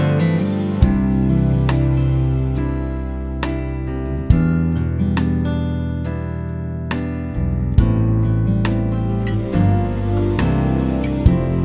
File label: Instrumental